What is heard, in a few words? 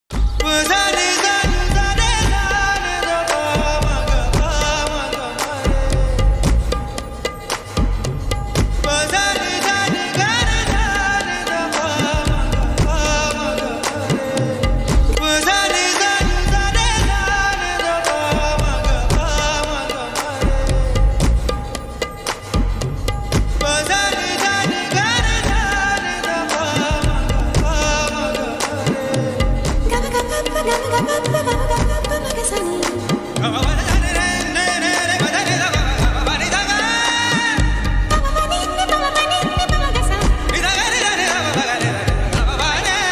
tamil love ringtone; bombay